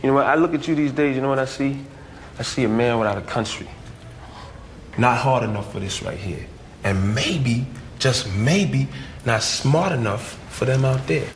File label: stringer; Man without a country (The Wire)